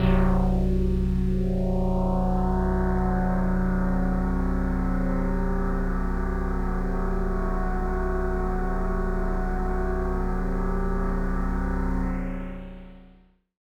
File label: One Shot Drone C, dystopian drone; One-Shot Drones